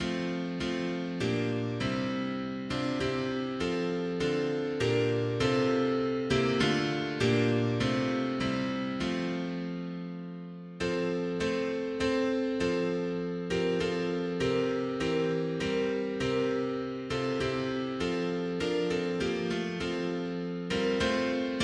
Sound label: mono piano; hymns; Himnario grande